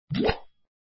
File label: switch